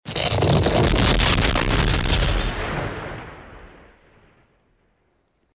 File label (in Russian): kamni padajut